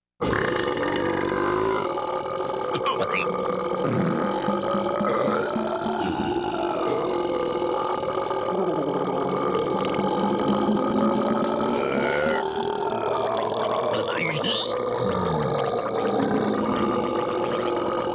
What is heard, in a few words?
burp; soundboard